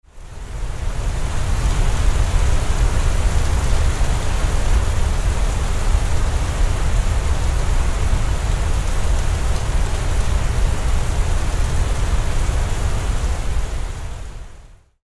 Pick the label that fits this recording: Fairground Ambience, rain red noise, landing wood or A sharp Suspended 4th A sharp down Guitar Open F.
rain red noise